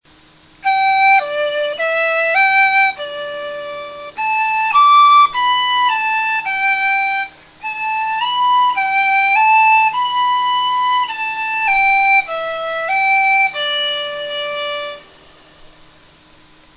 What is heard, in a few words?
dizi